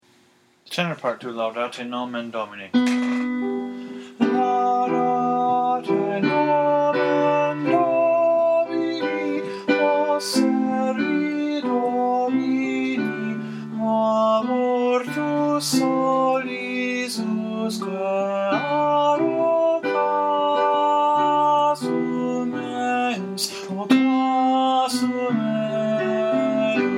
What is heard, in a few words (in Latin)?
laudate nomen domini tenor